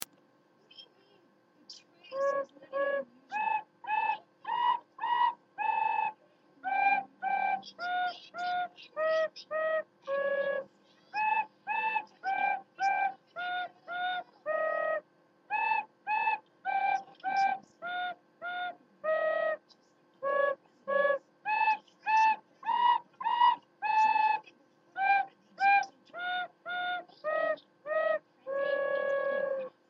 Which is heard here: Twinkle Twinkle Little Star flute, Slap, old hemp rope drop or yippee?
Twinkle Twinkle Little Star flute